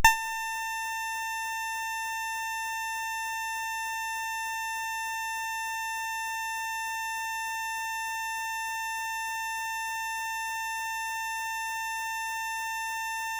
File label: sid CSG8580R5; Bird Mother